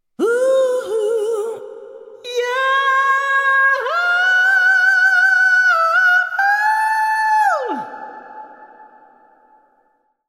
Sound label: Background Gated Hall